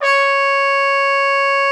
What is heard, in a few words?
TRUMPET C#5; Xsample VOL-3